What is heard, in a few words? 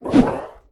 Swing; Atlas